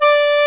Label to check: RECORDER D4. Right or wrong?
Right